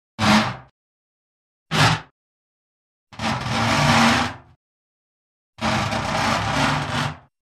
Metal Wronks Light Short & Light, Metal Bucket Movem; Hollywood Edge Foley Sound Library